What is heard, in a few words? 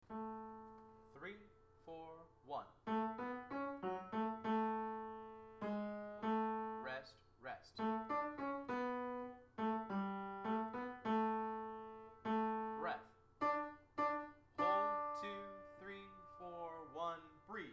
Hall Tenor, hallelujah chorus